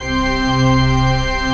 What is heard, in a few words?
PAD, Dance Mega Synth